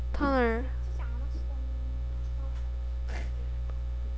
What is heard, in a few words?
tunnel